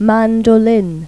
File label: MANDOLIN; Pipo-Aprende musica con Pipo